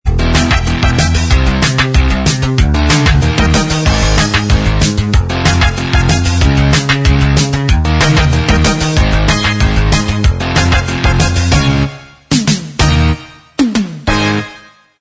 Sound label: theme Inst Track